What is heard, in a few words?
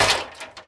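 strong bang of wooden gate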